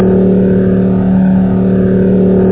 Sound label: ENGINE; Wreckage